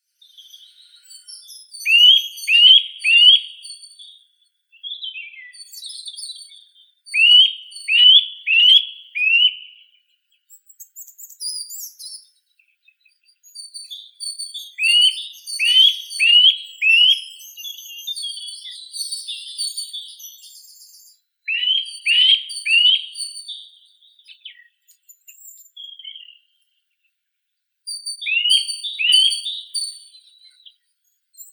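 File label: Eurasian nuthatch, Sitta europaea; popolzen obiknovennii; zvuki prirodi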